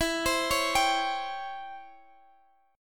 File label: Db Minor Major 7th Flat 5th E